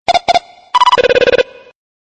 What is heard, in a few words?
ringtone